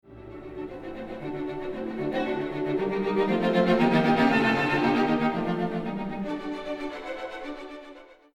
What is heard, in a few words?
Strings